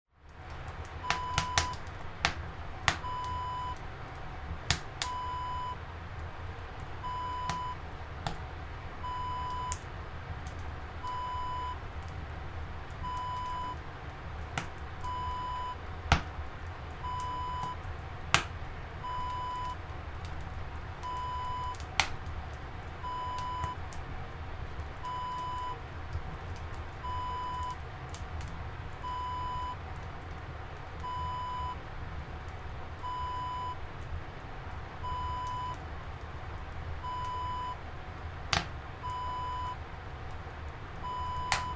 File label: sauna wood burning ambience